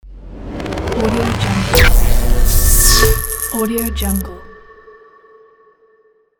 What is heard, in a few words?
electric logo